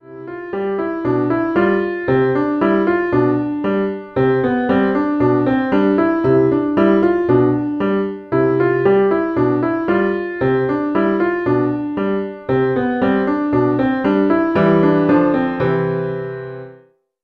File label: elementary piano solo